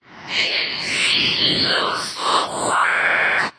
spooky vox; villospår

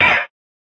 RevEh Chant; drums; hip hop; STYLE KITS (Hip Hop, Etc); Khezie Hip Hop Kit

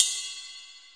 RIDE CUP; Hip Hop; STYLE KITS (Hip Hop, Etc)

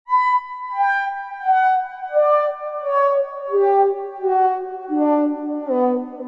descending plaintive synth; SYNTH AND ELECTRONIC

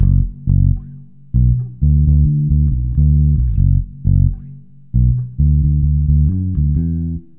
130 bassline; MELODIC LOOPS

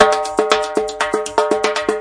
tight percussion, 119 bpm

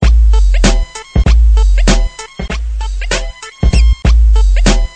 squeaky hiphop, drum loops and breaks, 097 bpm; 091 to 100 bpm

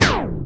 spin down, electronic hits

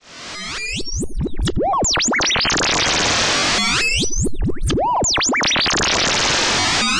arp style audio freakout; Electro and Synthetic

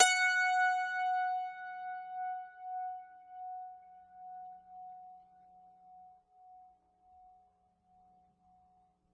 ZITH F, zither; multisampled; world

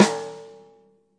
natural sd rim on; single hits

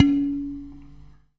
Clay bowl, ETHNIC and WORLD PERCUSSION

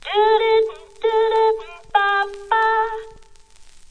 100 bpm Crackle Line; VOCAL OR VOXY LOOPS

